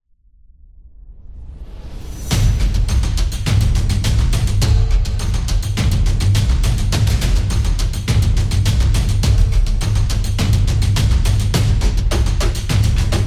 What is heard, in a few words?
Tension Building, thriller